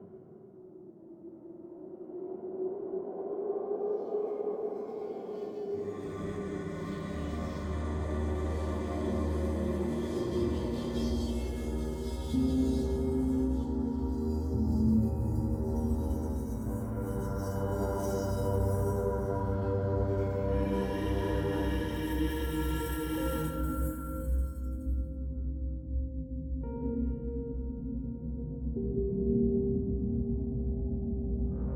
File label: Adventure Loop 4-4; Mistover